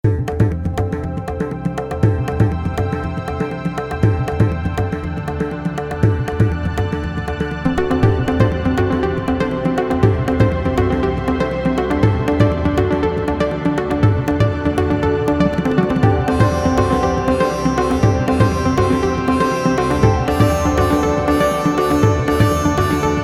electric sitar

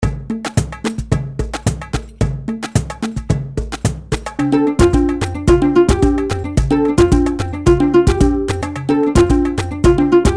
world kit

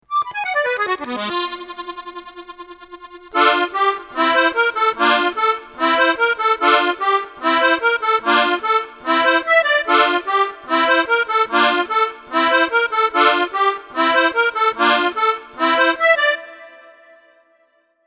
accordion

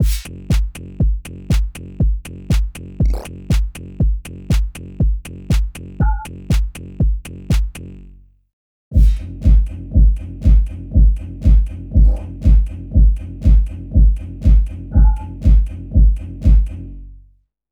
house beat

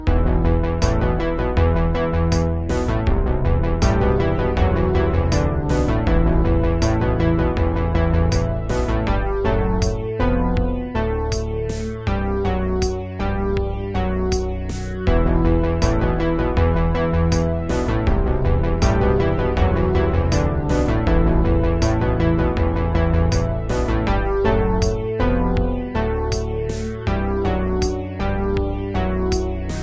roland juno-106 fat bass

